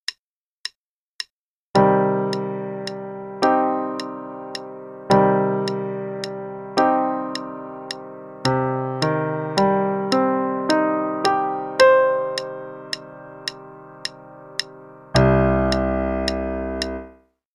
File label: Cm Chords (qn=108); Level 2A - 2nd Ed